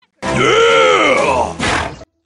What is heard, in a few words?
yheeeeeaaaaa